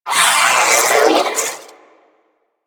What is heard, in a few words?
Sentient Battalyst Vocal Death; Warframe; Sentients